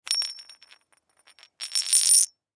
Coin Spin Single Pirate Gold Concrete; Money; Foley; Blastwave

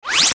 Ascend Airy Zip; Airy Sound Effects; Blastwave

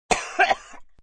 Chk Cof, A choking cough; Gagging

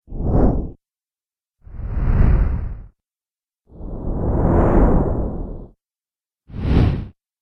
Dragon Wing, monster; horror; Dragon Wing Soundpack

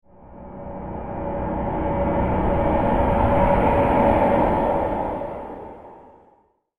Torture Chamber Transition, scary soundscape; horror sound effect; Andrew Fleming

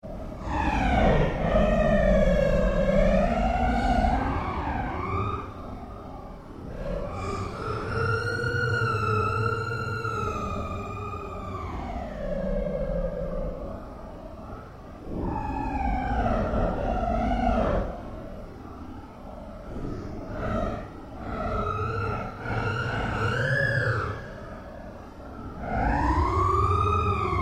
Created Cyber Whale Sequence, Alien Sound Effects; Created creatures, vocalisations and pretend speech

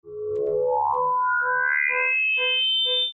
Logo Stereo Pulse; Feedback; Blastwave; Sonic Logos

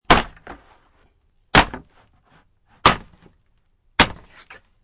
Wood Smashing, Smashing a piece of wood; Smash Sound Effects; Objects

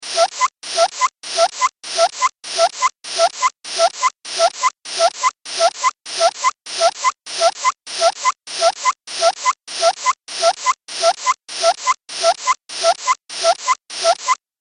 Cartoon Repetitive Pump

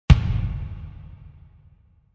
Impact Mid Tom; Impact hit for music production, video editing, movements, logos, flash, or animation

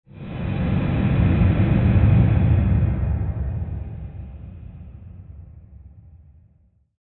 Swell Airy Rush Rumble; Sound Design; Swells; Big Room Sound

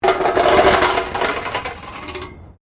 METAL BINLID SCRAPE WIRE FENCE; Metal (Sound Effects:Materials)

